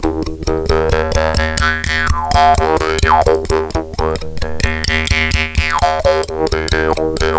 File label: Jew's Harp; Sonic Foundry; Hip-Hop Street Beats Construction Kit; Ethnicity